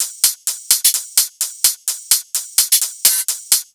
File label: Loops 128BPM, Drumloops